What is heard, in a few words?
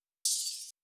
Drum Loop - 132 BPM Open Hat; Loop Stems; Cymatics; Vibes II Drum Loop Collection; Vapor